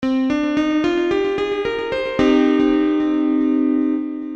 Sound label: Subminor